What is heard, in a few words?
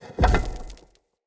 Weighted Stone Plate Switch Stop